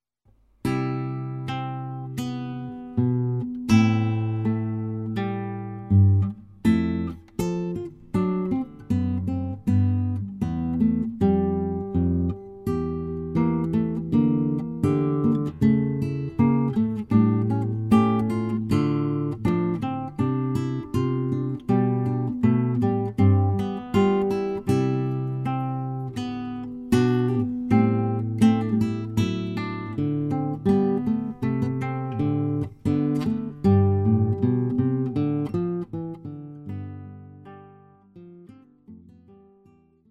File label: Air On A G String, guitar; wedding selections